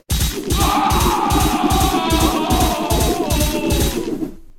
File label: reward, sounds